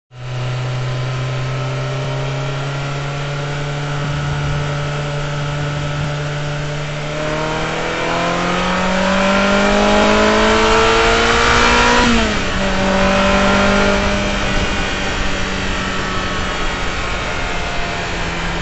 Ferrari F355 reprise 3eme

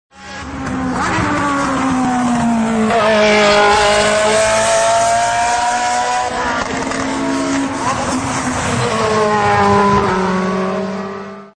Ferrari F355 Challenge passage

